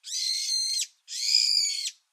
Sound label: hypsipetes amaurotis cry